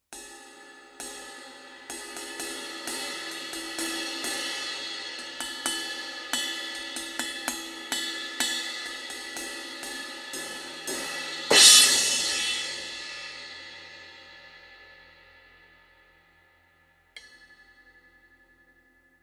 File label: Crash; drumsandbeats